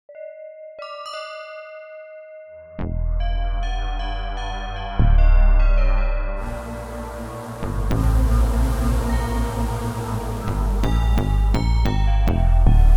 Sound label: Techno; Techno Perversion